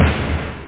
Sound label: wood; da capo vol1; drums snare